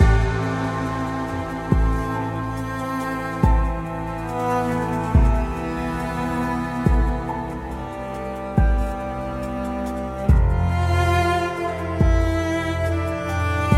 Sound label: distressed loop